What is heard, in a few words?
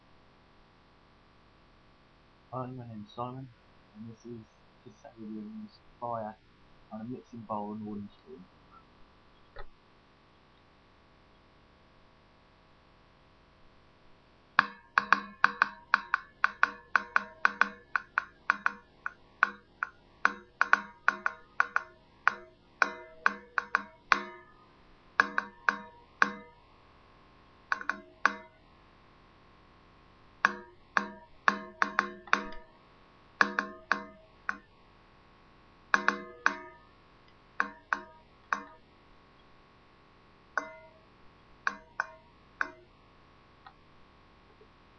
played on a mixing bowl with a wooden spoon